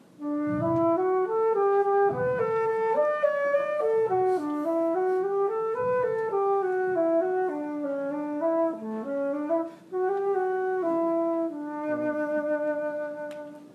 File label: alto; gakki